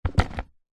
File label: musical violin in case set down on ground